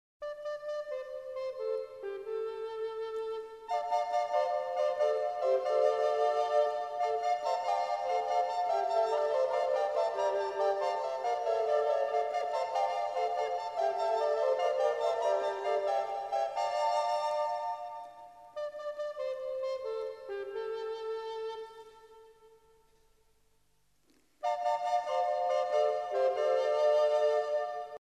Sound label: Prelude in E Minor; Solaris; The Martian Chronicles 1984